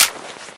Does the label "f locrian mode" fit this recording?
No